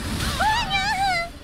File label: cute but painful noises